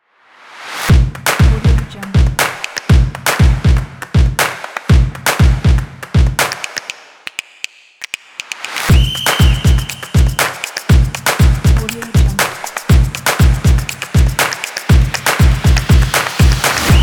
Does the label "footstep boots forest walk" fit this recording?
No